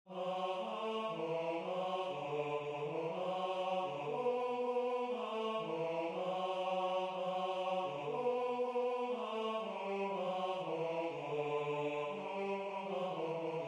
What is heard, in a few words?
Tenor